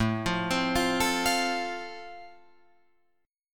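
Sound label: A Minor Sharp 5th, Guitar Open F, chord strum; Am#5 arpeggiated; 9,12,12,12,12,12; JGuitar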